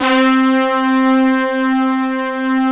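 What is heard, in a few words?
trumpet section C3; Synth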